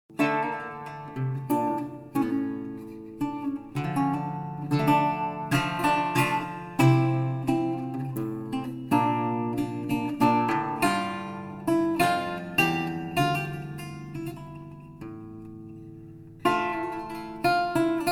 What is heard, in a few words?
French Lute Saraband